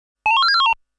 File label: Coin Credit; Namco Classic Collection Vol.2; arcade soundtrack